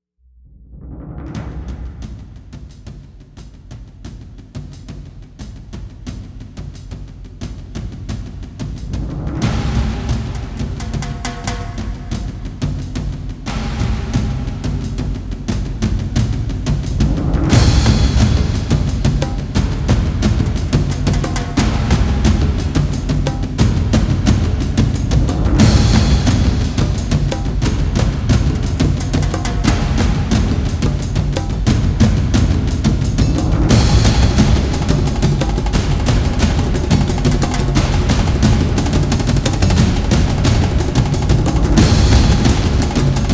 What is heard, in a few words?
maximum percussion